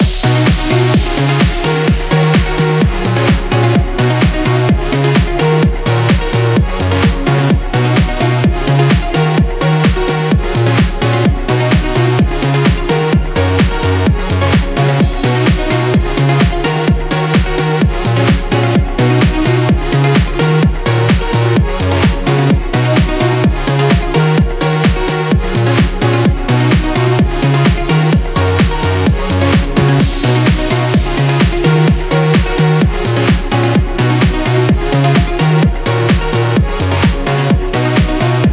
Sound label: EDM